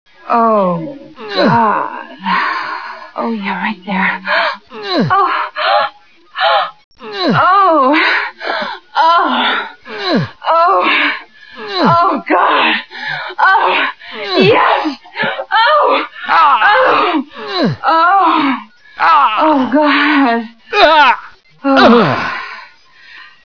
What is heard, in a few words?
encounter